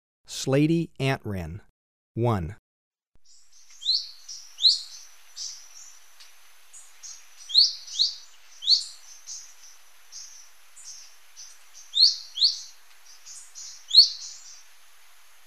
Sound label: Slaty Antwren, Birds of Ecuador; S. America